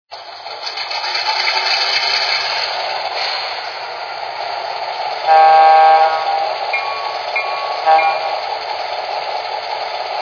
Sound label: SW1500, trains